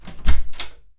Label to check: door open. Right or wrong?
Right